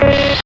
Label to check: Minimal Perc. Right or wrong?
Right